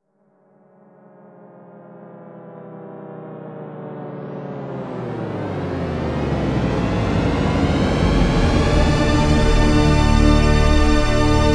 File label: deep note; Razer Phone 2